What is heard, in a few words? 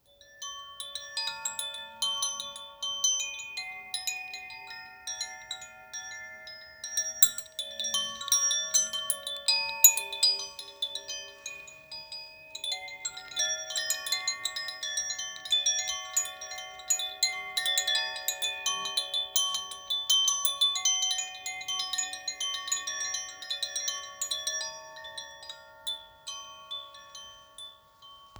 7 cakra chime; cakrova zvonkohra korenova 1 cakra